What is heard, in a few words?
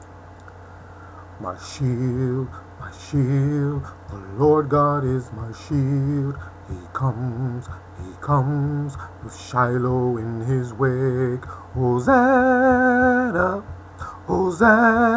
battle song